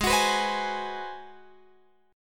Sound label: Ab 7th Sharp 9th, Guitar, Open F; x,15,18,18,15,15; strummed